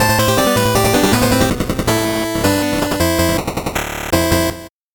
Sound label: 8bit jingle slow